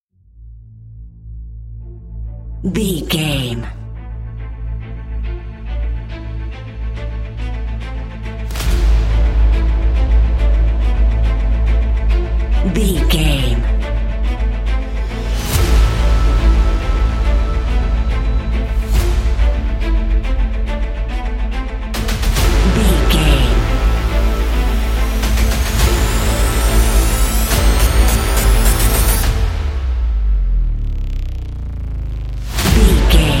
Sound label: epic action